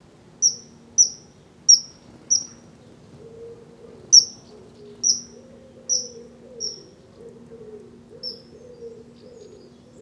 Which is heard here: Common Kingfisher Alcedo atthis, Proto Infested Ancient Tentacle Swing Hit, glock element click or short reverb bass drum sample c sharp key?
Common Kingfisher Alcedo atthis